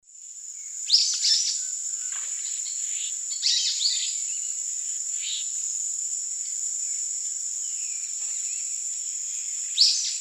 Myiodynastes luteiventris, song or calls; S. America; Eastern Lowlands

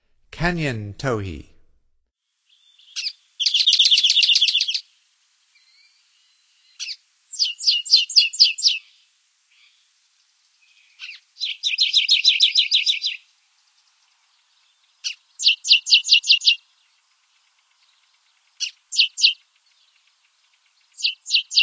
Canyon Towhee, Songs of Birds of North America; Stokes

